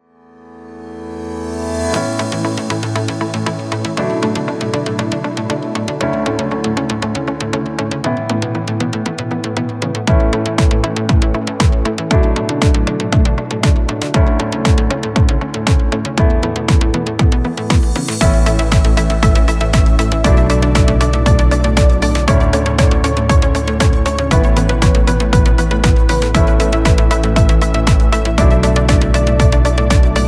Corporate

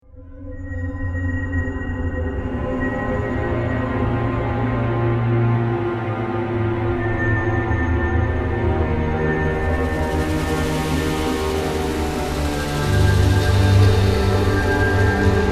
Alien Landscape

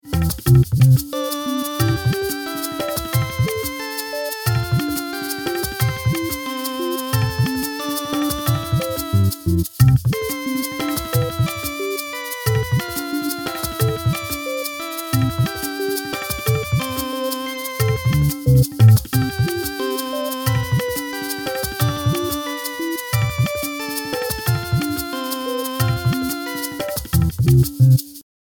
RYTHM; SUDOKU

